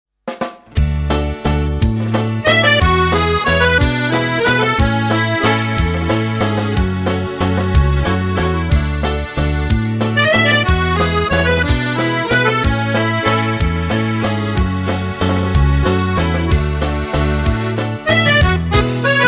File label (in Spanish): Nokiatune acordeon